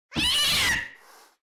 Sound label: cat scream; pineview drive